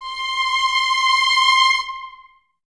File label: Violins Detache C 6; Miroslav Vitous; 11 Violins